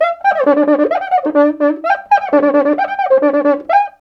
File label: 130 bpm, Rio batucada